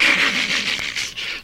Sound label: laugh; ernie